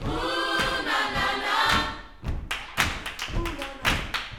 Vocal, Gospel, PHRASE; Spectrasonics

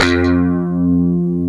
ROCK STRAT; E-MU Formula 4000 Series Vol. 3; Analog Odyssey

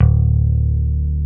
BASSES, Roland

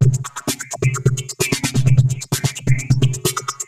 Cylon Beats (130BPM); sci-fi

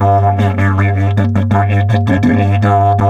Didge 80 BPM, Didgeridoo; Big Fish Audio - Didgeridoo and Other Primitive Instruments

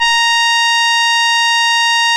A#, Synclav FM, BRASS; Big Fish Audio

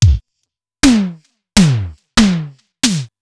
Simmons SDS2000

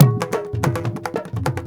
India, Heart of Asia

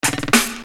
Fill; Construction Kit; HIP-HOP; Sonic Foundry (Sony Creative Software)

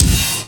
FX HIT, Techno Trance; Ueberschall

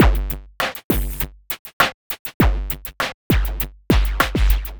perc 100 squelch, Percussive Loops 100 bpm; Sample Magic - Transmission-X

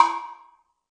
Tsuzumi Hi, Percussion; Roland BK-5

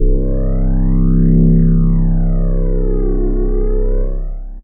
Pad; Dream Zone

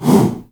HOO, SHOUT

